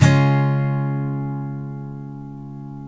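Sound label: steel C# major down, CHORDS STEEL, Guitars; Spectrasonics; Hans Zimmer Guitars VOL-1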